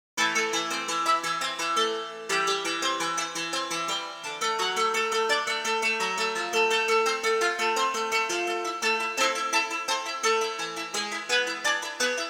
Sitar

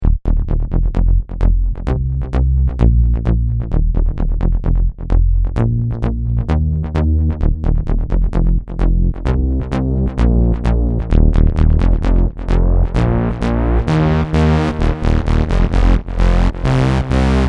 blue bass

